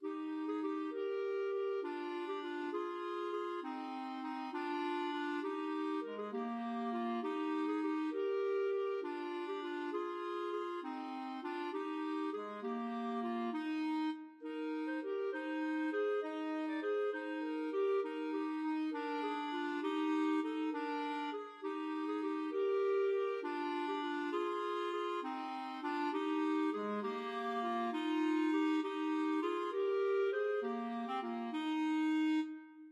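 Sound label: sonata 331 clarinets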